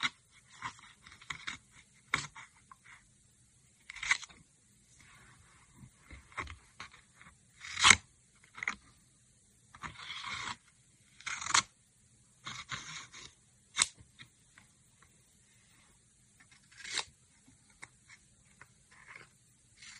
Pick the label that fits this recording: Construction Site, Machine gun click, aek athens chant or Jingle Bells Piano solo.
Construction Site